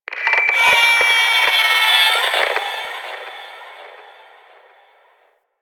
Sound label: Vomvalyst Lure Song; Warframe; Teralyst